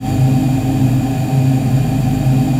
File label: VOICE PAD, ATMO PADS; Best Service; XX Large